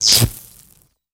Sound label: Elect Arc Zip Poof, arcs & sparks